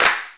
Single Claps

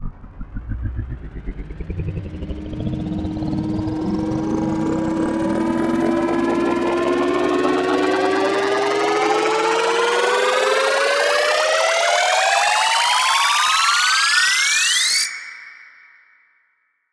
Edm Riser 8 Bars 126 Bpm

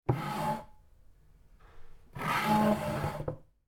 Modern Wood Chair Fast

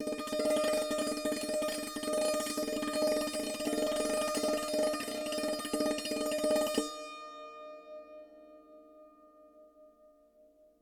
Sant Roll D#5

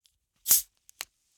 Opening A Soda Bottle

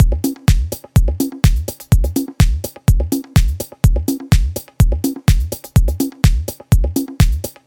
125 Bpm Funky Conga House

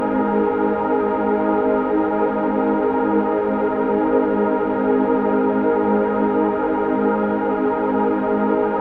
Ambient Downtempo Pad

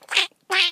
Duck

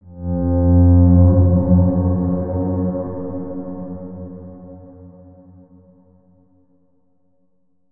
Battle Horn

